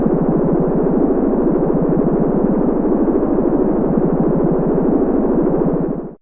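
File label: Oscillator, Fairlight CMI IIe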